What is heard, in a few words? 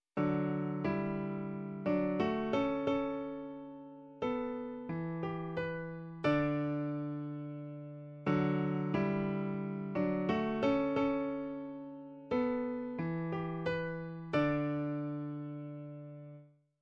Major Key; Grade 6